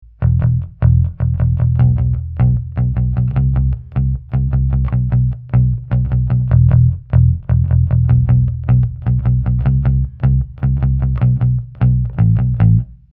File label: C-80 Bass